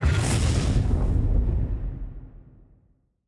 Hoverboard Activate; Jake; subway surfers city sound effects; Android, iOS

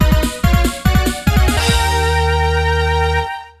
Stage Clear; Mega Man Legacy Collection; Mega Man Legacy Collection 2

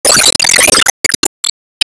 Lightningspear Pillar Hit

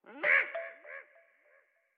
Mech Aggro; StyxMech

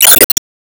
Flare

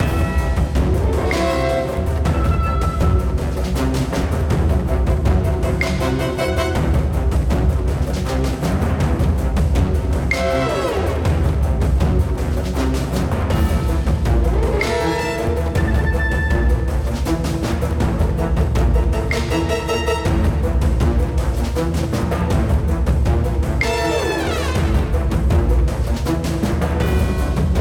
Island Boss Loop; MUSIC HT3 Island C Boss Loop

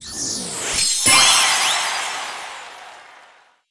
Se Sys Topleague Reward Season

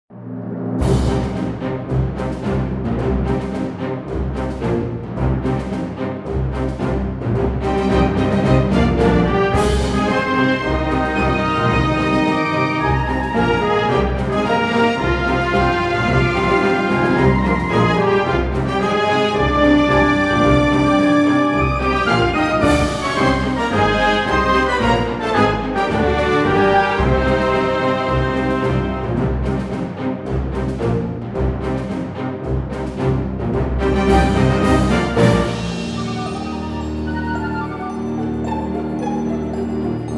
theme tense; Cris Tales; PS4 PS5 Stadia Switch Windows Xbox One Xbox Series X/S

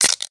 Revive Reward; subway surfers city sound effects

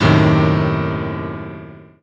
Shock; Manzai; Puyo Puyo Puzzle Pop; iOS, macOS, mobile

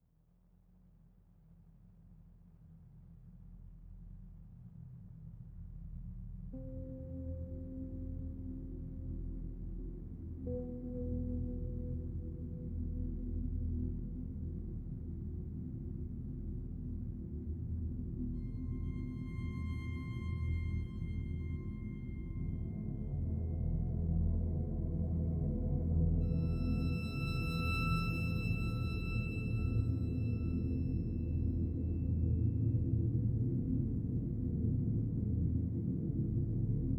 Forest (neutral); datura; ps3

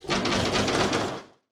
Window Katakata

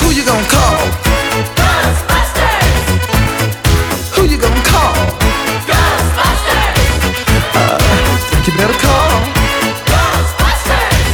Vocals - Chorus Vox Long; 3rd Chorus; ICE

